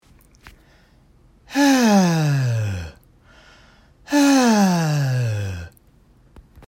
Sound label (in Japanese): 音色の変化